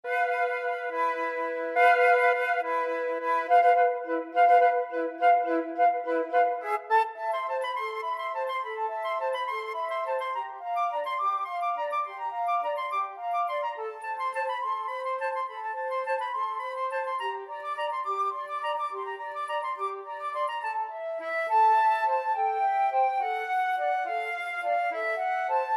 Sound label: flute duet